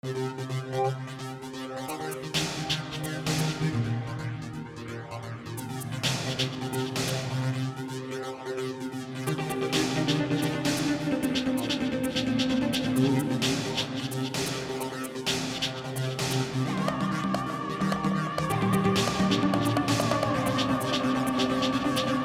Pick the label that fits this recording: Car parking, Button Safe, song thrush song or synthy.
synthy